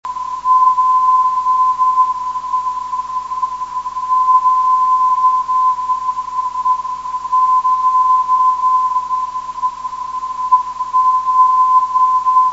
580khz

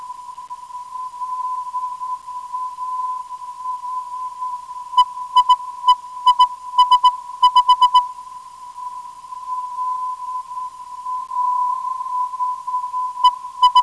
LAH 392kHz

